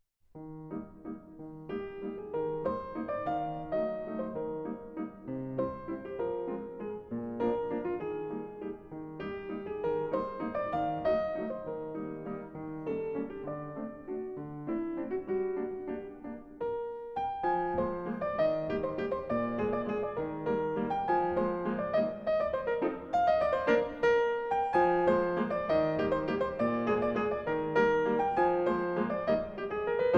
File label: Waltz